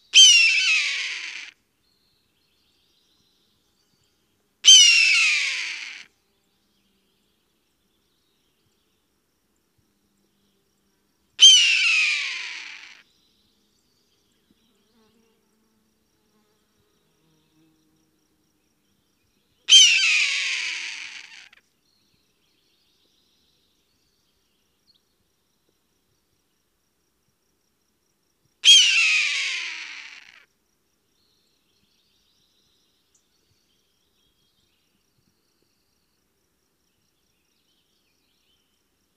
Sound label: Hawk Red tailed Scree, hawk